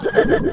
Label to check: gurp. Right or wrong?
Right